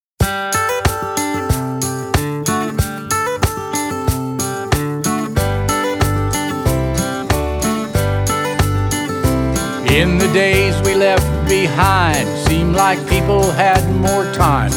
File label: Jingle; Frost Bank